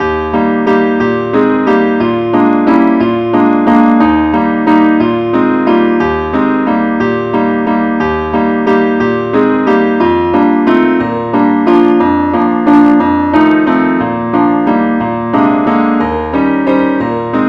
advnceacc key ees; angelvoices

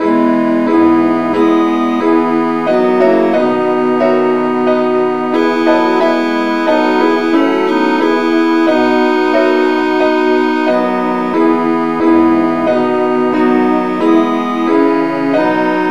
satb key e

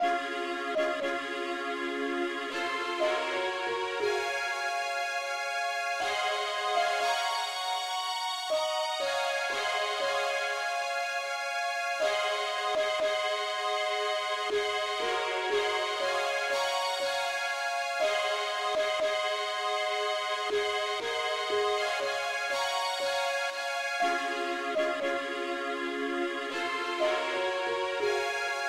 uke lead, key cis; pilot

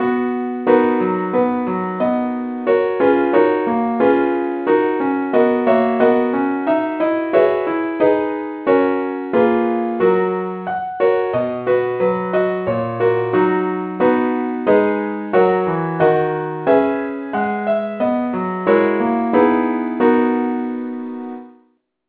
keyboard only key B; saintthomas

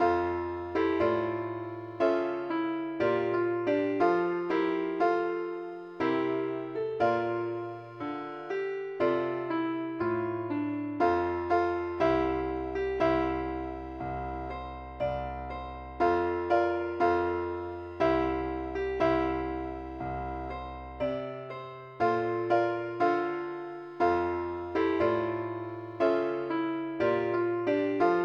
ukelele, key f; pilot

